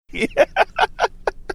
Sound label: chuckling saying yeah